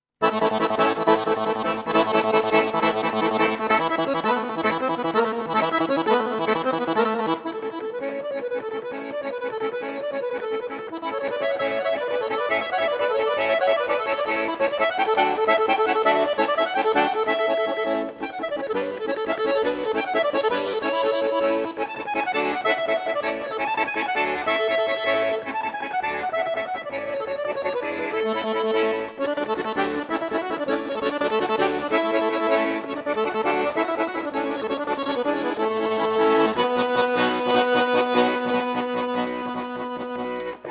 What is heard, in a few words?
Bulgarian suite Daichovo Horo